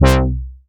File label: Synth Bass; Vengeance Samples; VEE Synth Shots